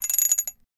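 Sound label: Chain On Cup Short Light; chains handcuffs & shackles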